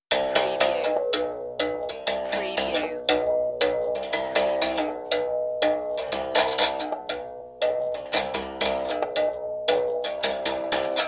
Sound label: Berimbau Long Version